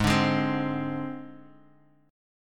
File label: G sharp Major Flat 5th, Guitar, Half Step Down